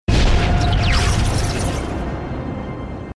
Lightning Msg

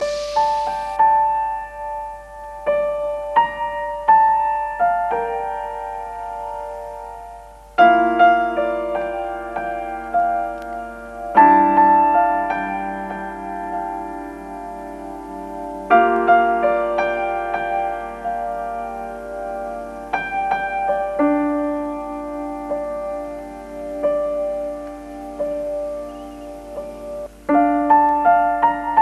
Climax Bgm Sad

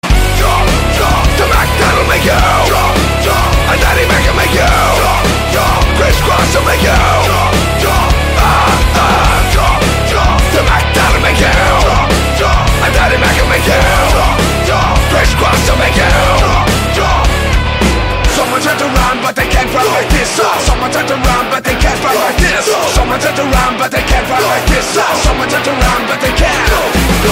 Metal Jump

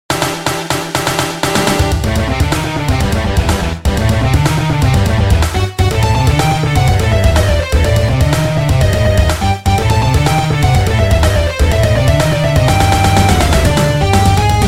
Ring; X6 Boss

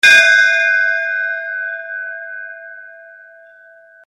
Temple Bell Echo

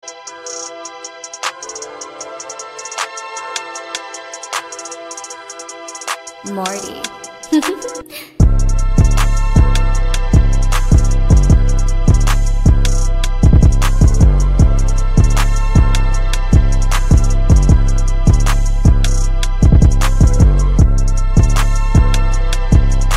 type beat

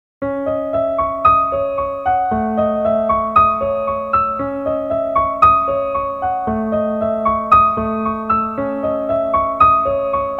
Piano Farewell

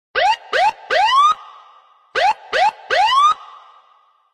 Whoop Whoop Police

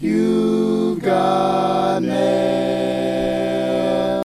Barbershop Quartet

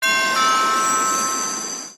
Celestial Doorbell

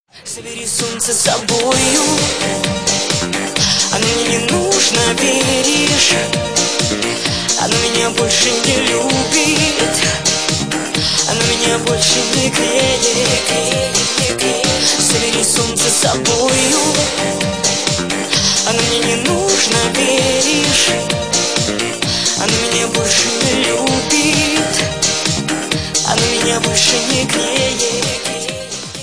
Russian Ringtone

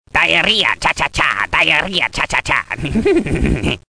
cha cha cha

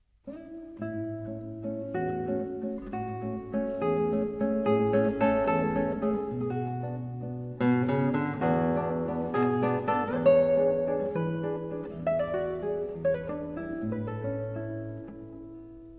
Original Nokia Tune

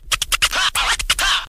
Dj scratch

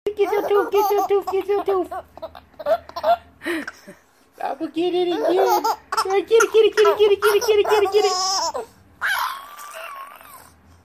Laughing Baby

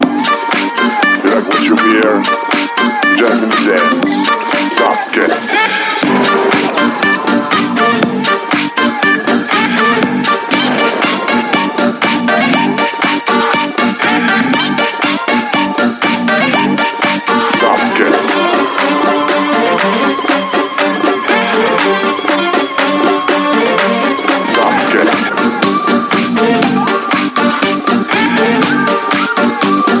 Dabke

